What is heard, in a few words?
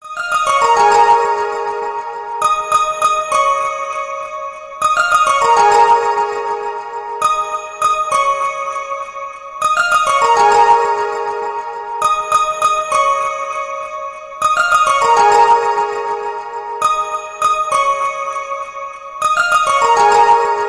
huawei tune ringtone; android